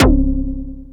Anasound Short to C#, Industrial Analog Single Notes; Sonic Foundry (Sony Creative Software)